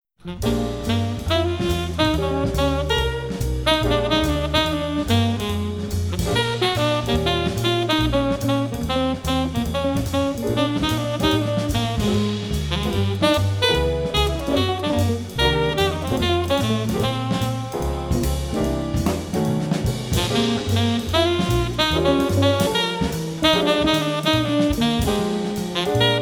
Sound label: Zoots Blues